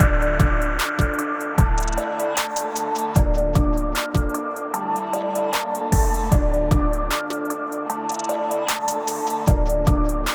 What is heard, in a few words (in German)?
beat nachher